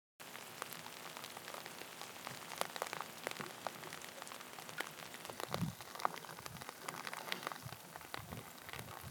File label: Light Rain Atmosphere